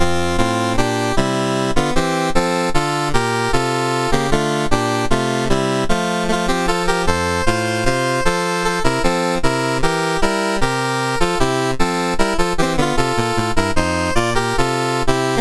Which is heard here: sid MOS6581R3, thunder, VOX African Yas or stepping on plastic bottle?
sid MOS6581R3